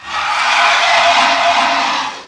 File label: facehugger dying